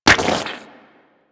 Chem Strike Nox Rifle Projectile Impact

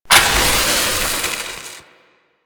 Proto Infested Maggot Spark Start; Warframe; Abilities

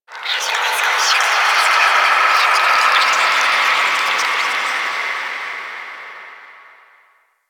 Moon Whispers Small, Ambience; Warframe; Orokin

